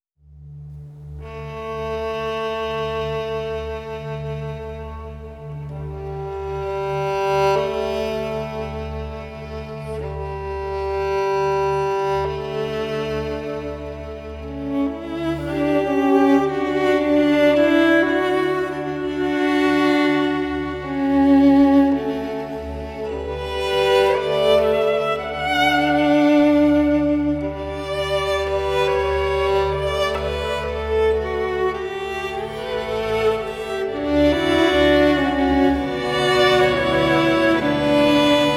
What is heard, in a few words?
Lullaby (String Variation); Assassin's Creed Mirage Valley of Memory; Ishaq's Lullaby